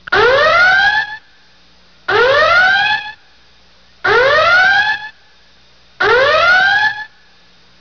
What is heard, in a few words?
col alarm